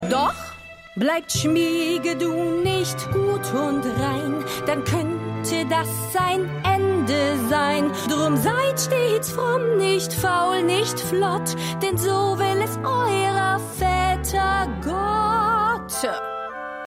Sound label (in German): Gesang; Schmigadoon; Mildred Layton